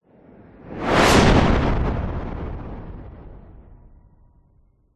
vehicles fighter jet pass by zoom past very fast designed